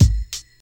crispy bass drum single shot g sharp key, steel kick drum sample